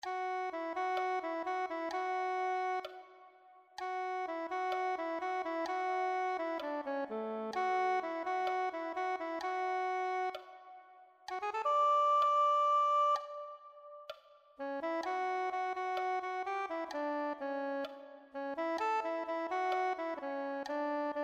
soprano saxophone

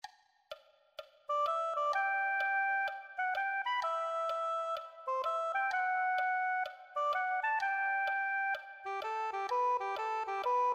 soprano saxophone

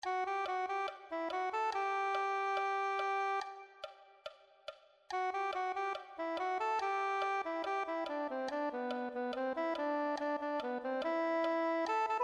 soprano saxophone

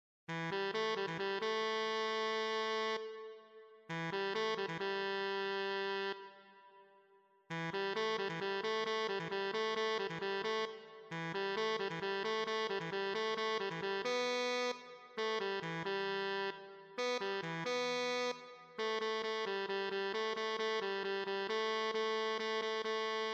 tenor saxophone

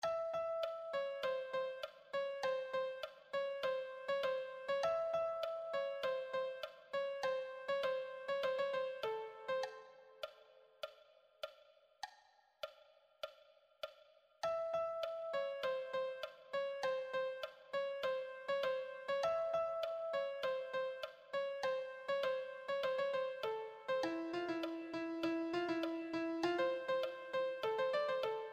lead, piano

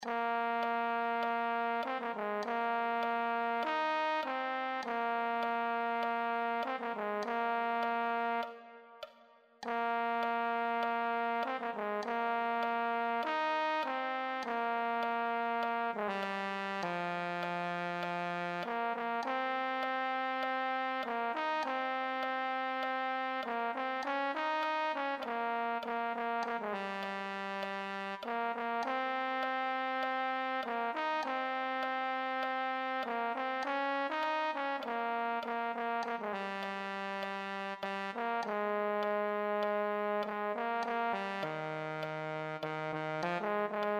euphonium

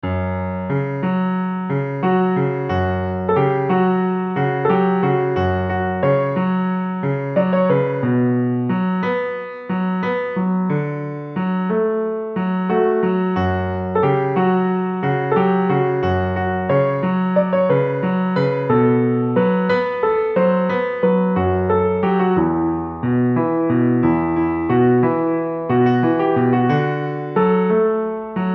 solo, piano

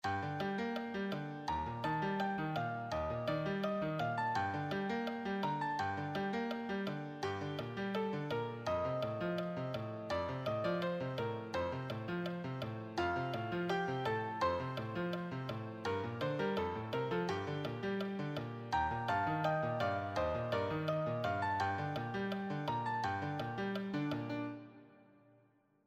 solo

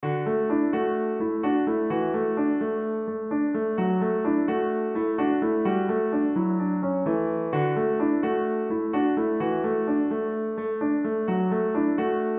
solo, piano; Dum Laga Ke Haisha

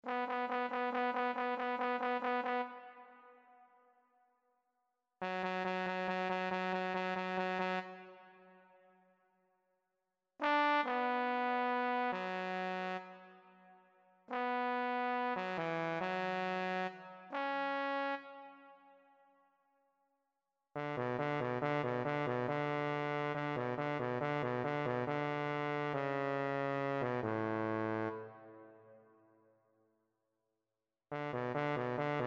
euphonium

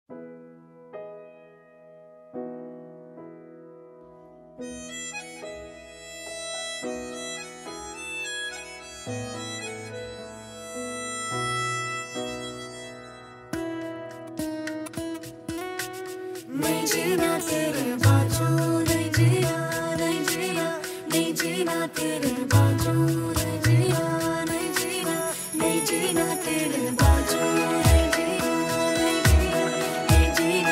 backing track

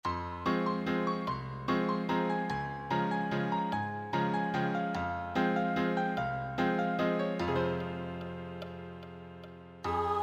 main accompaniment

